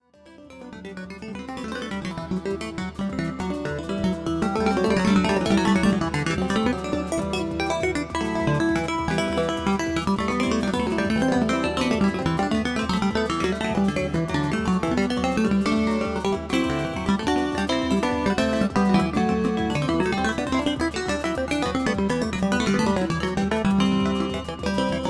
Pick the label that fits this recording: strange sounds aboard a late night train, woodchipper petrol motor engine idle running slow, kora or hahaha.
kora